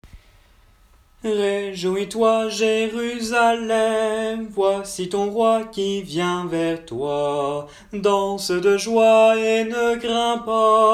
Cantique des trois enfants

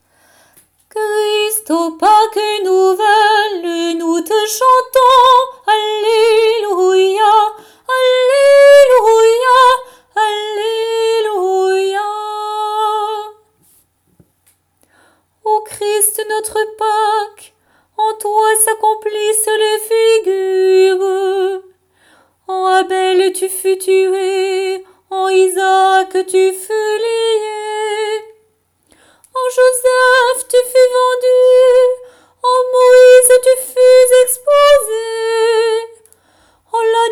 chant final christ o paque nouvelle

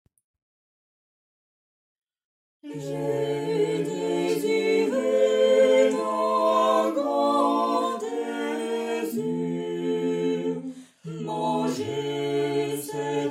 4 voix; J'ai désiré

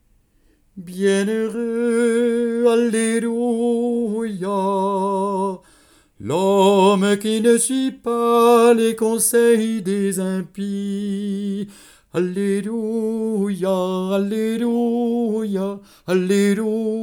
Alleluia Bienheureux

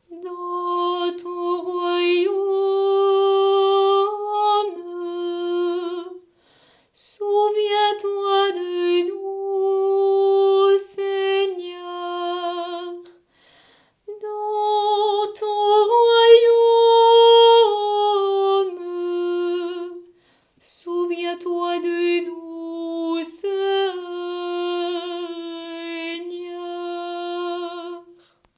Repons Dans ton Royaume

